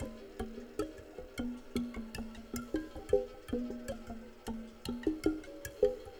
AMBIRA, Liquid Grooves; Spectrasonics; Liquid Grooves 1 & 2; AF.PLANET

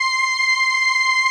505, String Machines

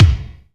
Reverb Kick Snare; PS-37 Dance Synths; Percussion

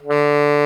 TENOR SAX D3; Greytsounds VOL-1

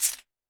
Roland TD-20 Percussion

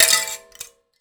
Finger Hit, Prepared Kit; Battery 3 Sample Kits

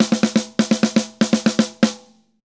Drums Poly 120, Drums & Percussion; Best Service; Festa Latina VOL-1

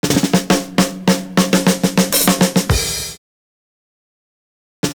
BIG ROLL, LOOP

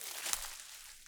Rustly Step, Footsteps, outdoor

